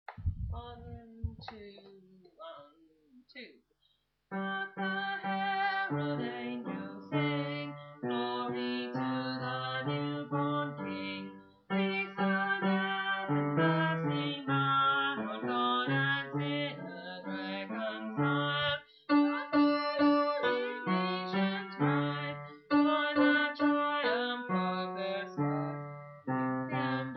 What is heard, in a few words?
Bass